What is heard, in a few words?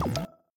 typewriter key hit wrong answer